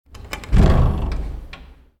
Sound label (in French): porte